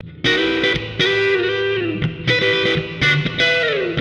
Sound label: Guitar wet; lego universe; Nimbus Station